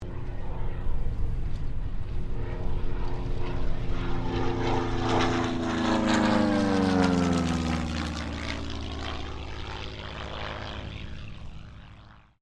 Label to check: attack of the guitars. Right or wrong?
Wrong